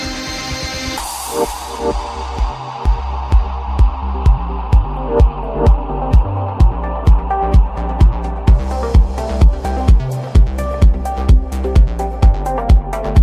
Healing Music; Lucio

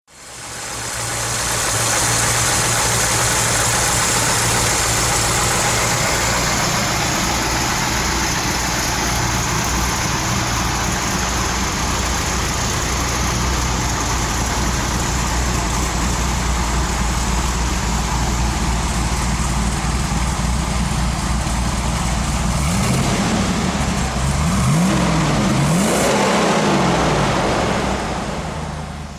Mustang Boss 429

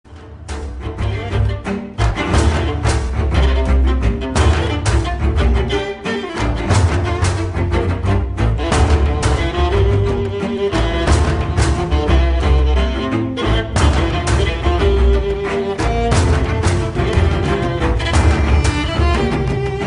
the violin

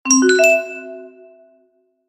Rising Tritone

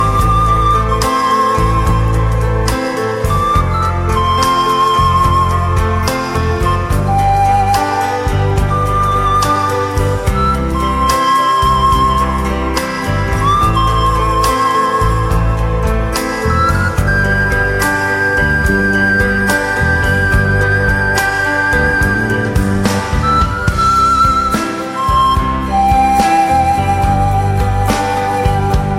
flute

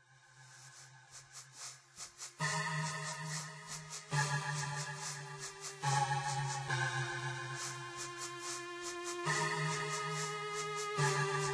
dubstep

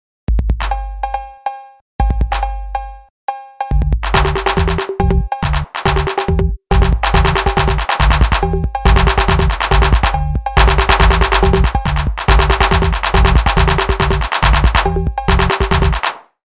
Happy Ringtone

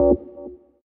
cursor

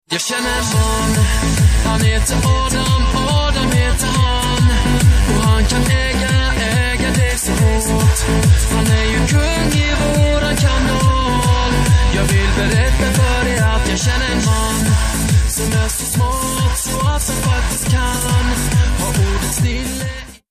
Bass Tone

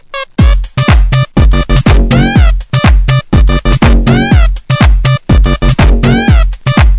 Disco Time

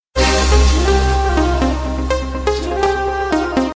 wonderful tone; classical

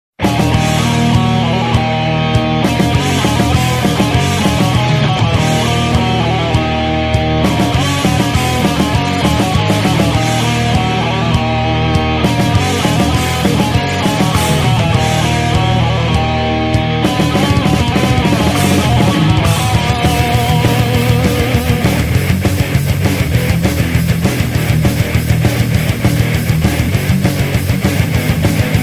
Melodic metal

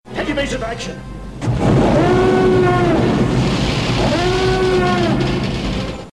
Star Sound

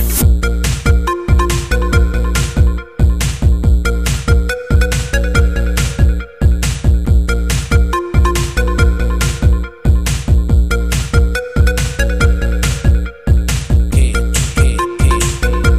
BreakBeat

